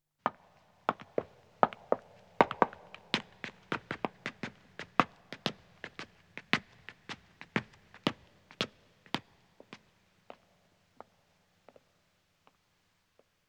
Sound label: Stone Stairs (Echo) Ascending, Footsteps; BBC Sound Effects Library; Footsteps II